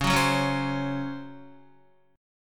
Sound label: Db Major 7th Suspended 4th Db down Guitar; strummed; JGuitar